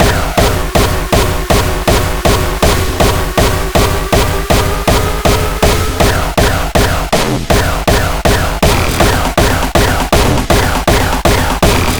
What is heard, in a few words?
Beats Loop; Anger Foot